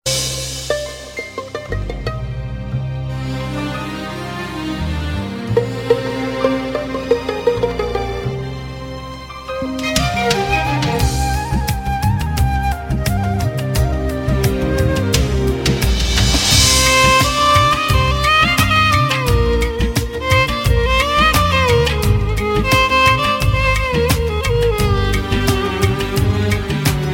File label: Rings